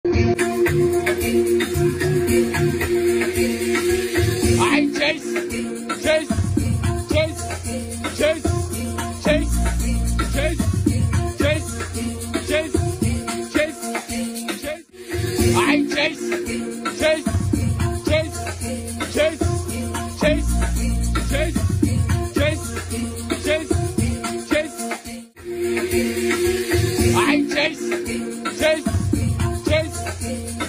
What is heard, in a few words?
amapiano